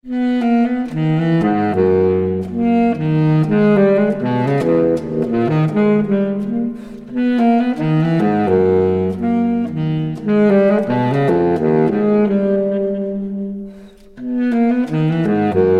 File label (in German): menuett baritonsaxophon